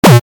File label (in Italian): suoneria botton; beep